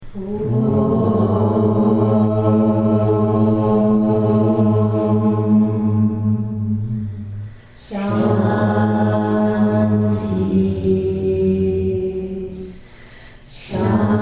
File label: Om Shanti